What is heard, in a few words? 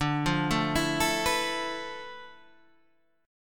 D Minor 6th Add 9th D